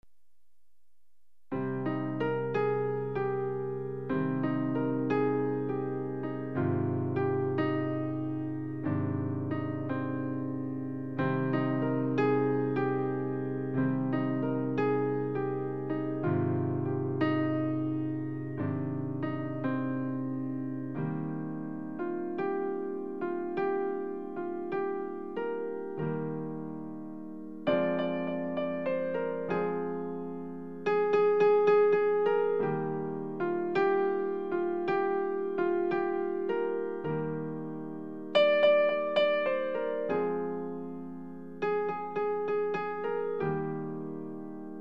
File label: Piano